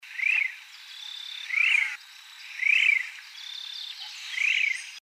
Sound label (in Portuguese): canto da paturi preta